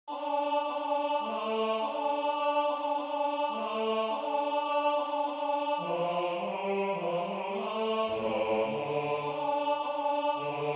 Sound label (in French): MAGN Basse; Durante; Magnificat